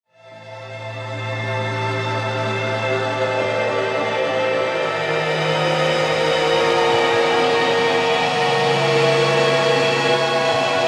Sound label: Waiting gong